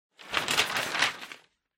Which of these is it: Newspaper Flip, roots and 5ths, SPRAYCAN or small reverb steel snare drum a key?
Newspaper Flip